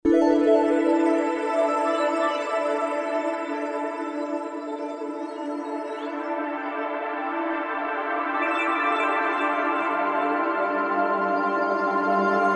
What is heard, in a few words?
Star Gazer